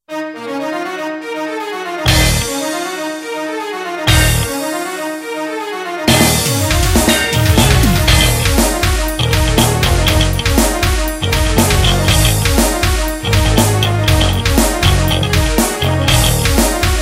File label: instrumental; Состязание Братьев